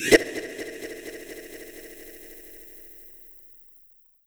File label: FX Impact